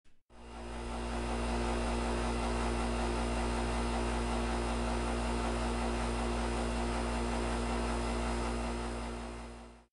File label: Sounds of Home Appliances